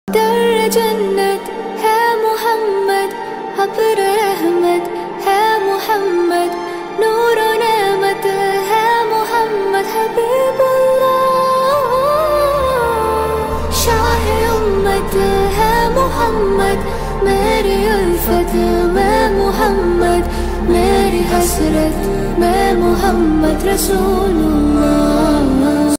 arabic ringtone

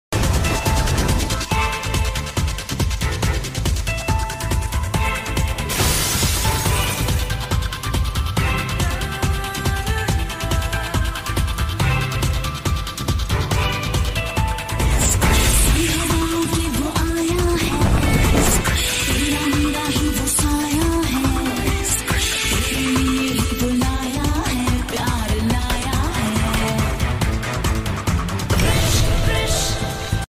BGM ringtone; krish

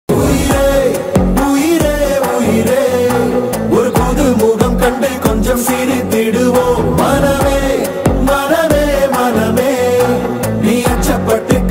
tamil ringtone

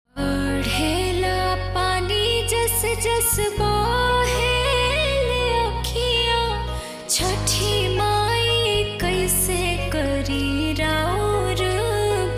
bhakti ringtone; chhath Puja Geet

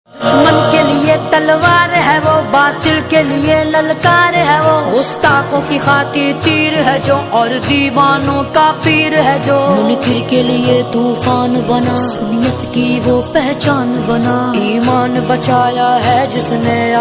islamic ringtone

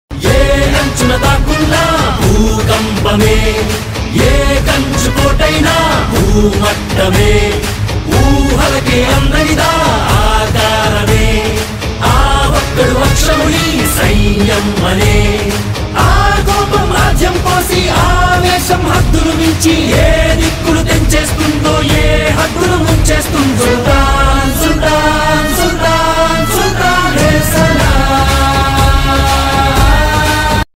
telugu ringtone

